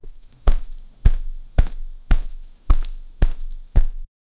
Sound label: concrete footsteps